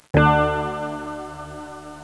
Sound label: KLICK